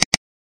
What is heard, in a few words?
Mouse Double Click